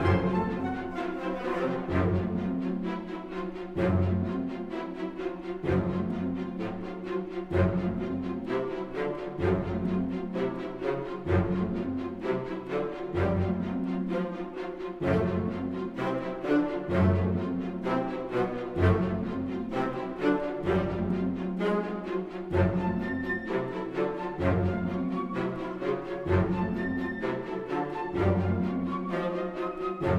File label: Danger 64bpm Loop; Music Dialogue